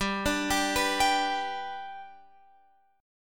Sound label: G Major, Guitar, Open F; arpeggiated; x,14,14,14,14,14